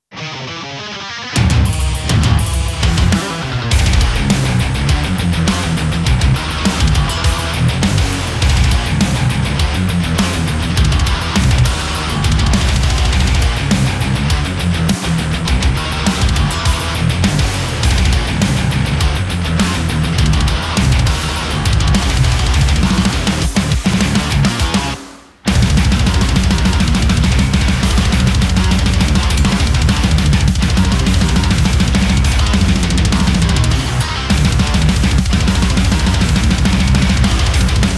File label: higain; kemper